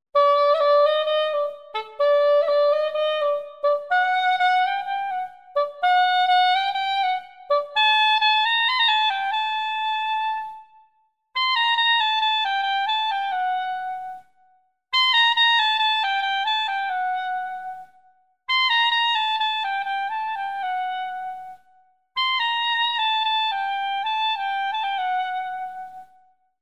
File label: C soprano wedding dance, C-Soprano Sax; Conn C-Soprano; beautifully restored; using a Buescher C-soprano mouthpiece; Chicago IL; Little Sax; restored by cybersax